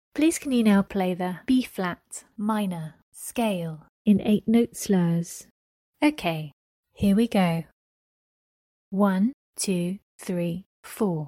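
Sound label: b flat minor scale 8 note slurs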